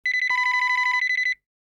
telephone ring

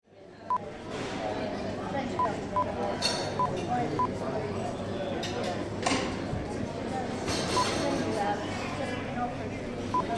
Cafe Sitting Down

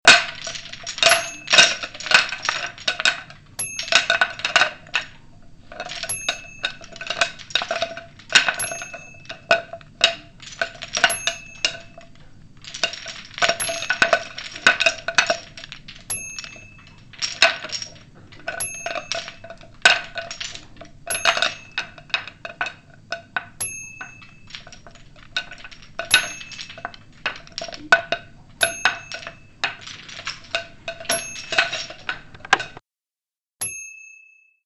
skeleton bones

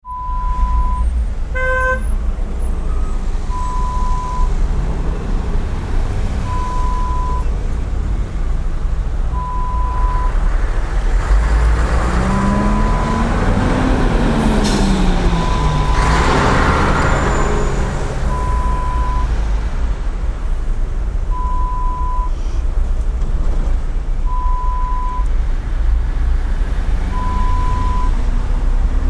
Heavy traffic sound

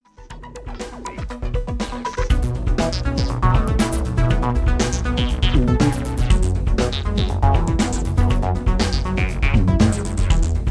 Electro Ambient

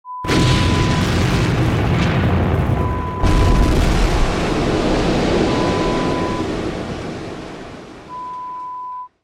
Explosion sound

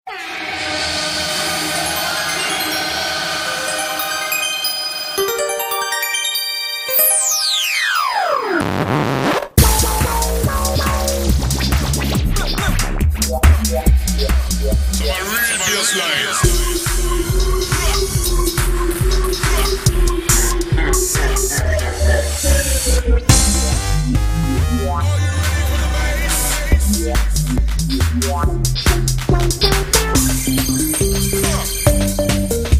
DUB WISE BASS LINE 4X4

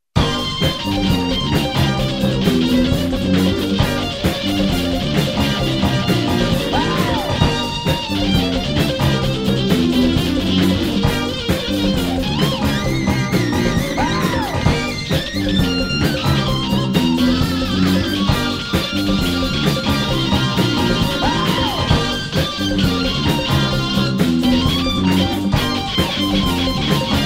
shred

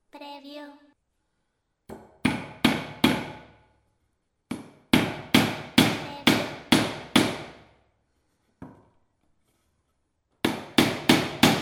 Hammer Hits Wood

